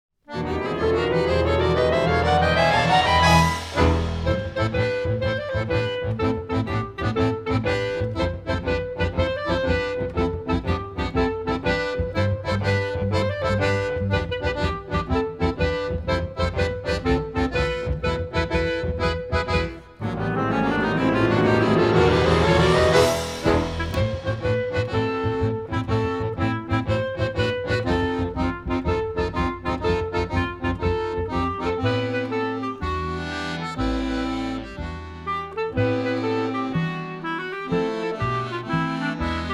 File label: playbacks; barouf; classe danse